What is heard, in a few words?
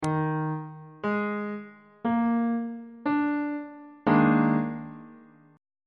d sharp major 7th suspended 4th chord